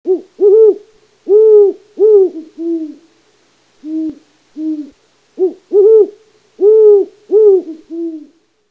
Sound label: Great Horned Owl